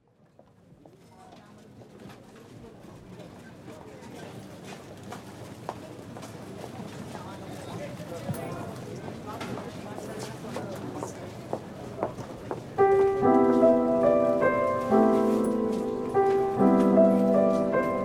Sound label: Jingle Bells cover